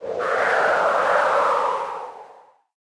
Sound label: Wind; New The Denpa Men